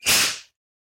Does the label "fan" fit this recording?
No